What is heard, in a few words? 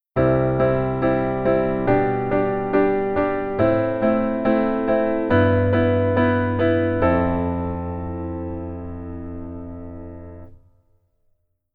Keyboard Rhythm Basic